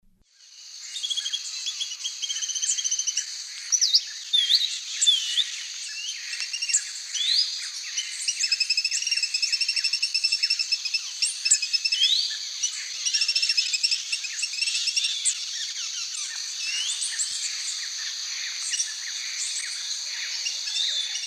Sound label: Pachyramphus minor, song; S. America; Eastern Lowlands